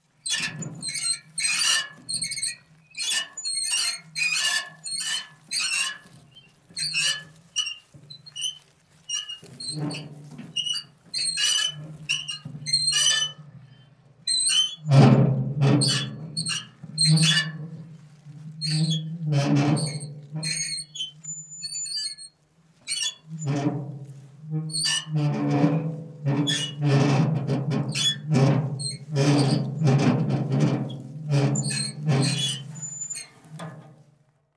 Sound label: wheelbarrow